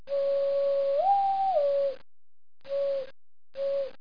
bird; PRESKING; Transcription: ooooooooooooooo

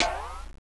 CLICK

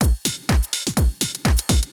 drum loop house 125; eJay

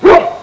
st. bernard bark; pets; Sound Effects for Multimedia - Volume 1

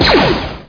RIFLE

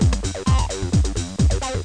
loop; direcpc

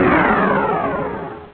Warp Off; Missions of the Reliant!

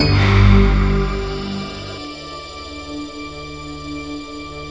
EMU pad; The Sample Bank; ensoniq

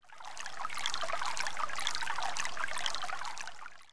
brook

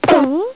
drop

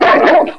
dog bark; kingpin

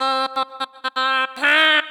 VOICE GRL HOUSE 125 A, House Voice

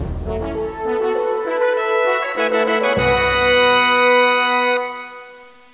FANFARE; Civilization II; Civ II 68K Demo

